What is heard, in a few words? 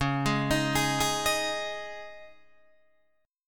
C sharp Suspended 2nd, C sharp, Guitar, Open D